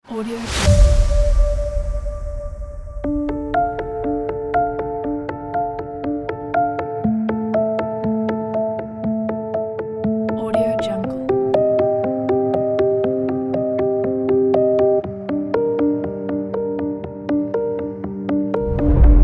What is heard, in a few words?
emotional sensual epic piano